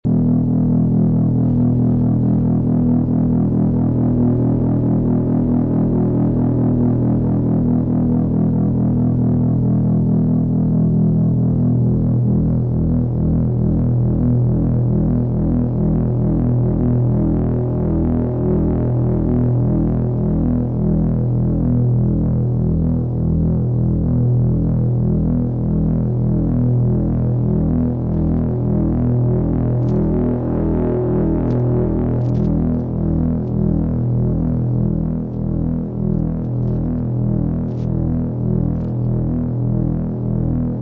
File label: ring modulation